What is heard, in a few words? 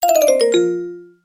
incorrect